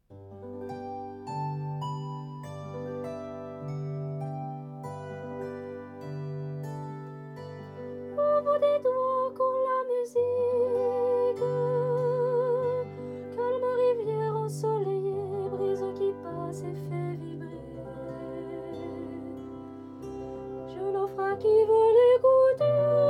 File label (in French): Chant du secret